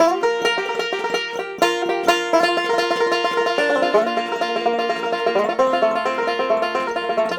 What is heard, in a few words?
banjo; Zomboid; EntertainYourself